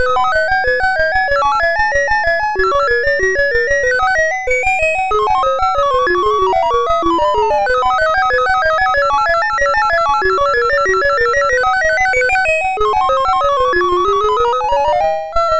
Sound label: sid MOS6581R4, GAMES; Chinese Juggler